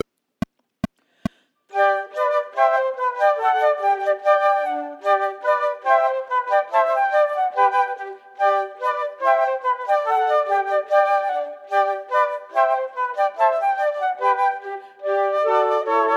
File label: Allegro Assai Quartet minus 1st part; Haydn